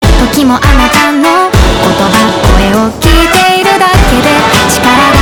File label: orchestral